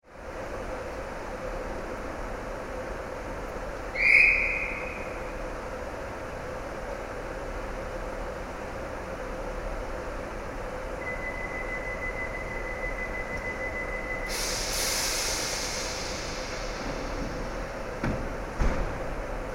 2 coach train leaving from edinburgh waverely station binaural head